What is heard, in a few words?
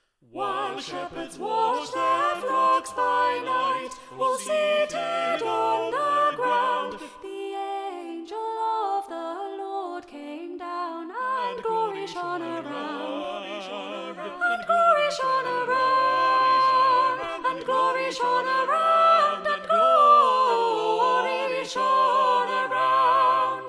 Sop Up, Old Foster